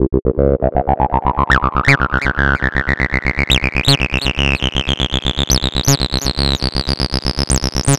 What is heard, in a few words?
Turkish Phase; bassline synthesizer plugin inspired by the legendary 303 basslin; AcidBox V2